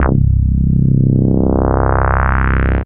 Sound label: Single Electric Basses, Dance Floor Bass